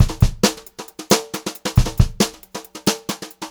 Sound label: 136 VARI, Brushed Drum Loops